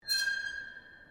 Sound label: METAL IMPACT SCREECH